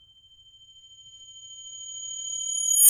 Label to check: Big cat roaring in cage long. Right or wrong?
Wrong